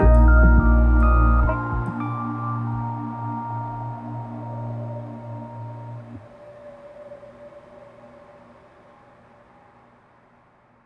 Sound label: Windy Outro; Snow Day